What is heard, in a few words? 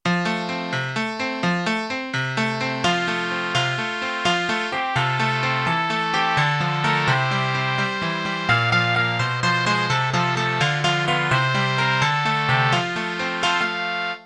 row row row your boat ringtone